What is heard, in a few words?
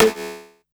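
snare drum, Drum & Bass, 162 BPM; Ueberschall; Drum & Bass Resonance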